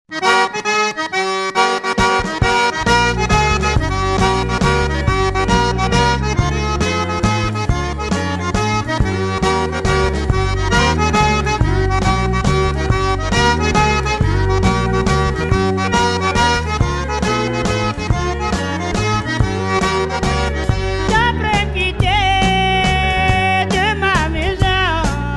waltz

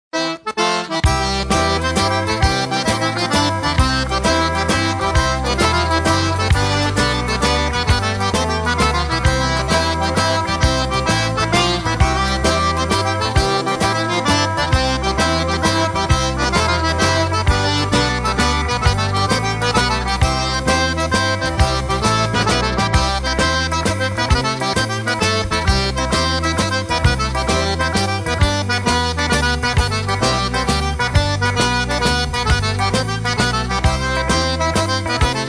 waltz

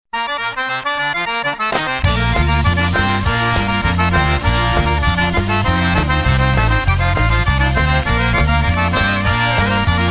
WALTZ

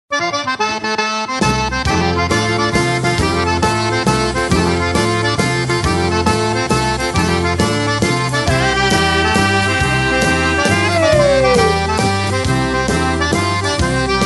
waltz

